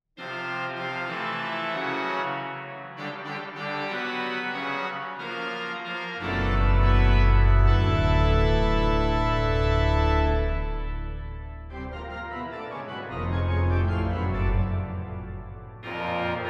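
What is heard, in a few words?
paraphonic fanfare, organist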